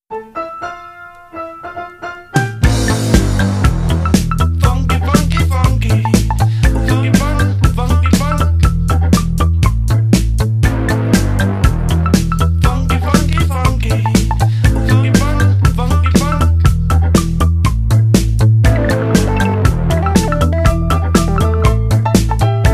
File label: funky funky